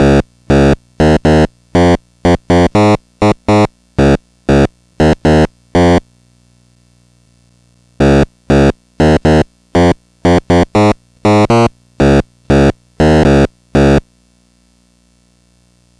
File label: Atari 2600 Bass, chiptune